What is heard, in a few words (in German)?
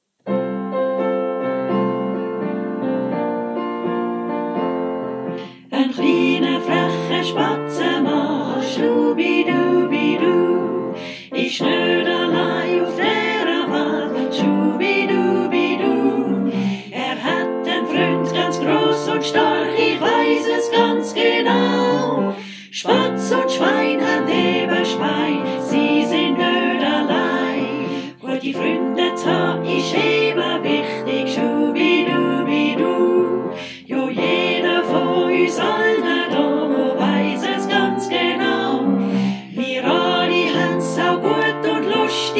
Lied Version Gesang